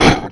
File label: monster grunt